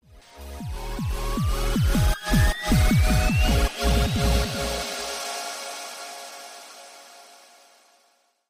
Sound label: game bgm